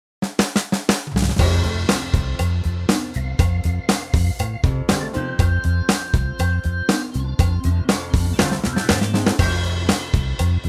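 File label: F no guitars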